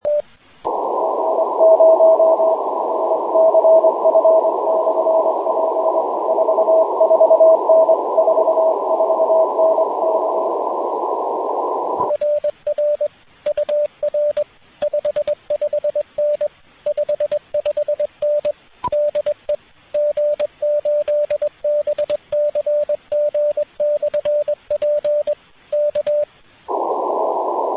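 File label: contact, CW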